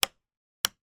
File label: household wall mounted mains switch on off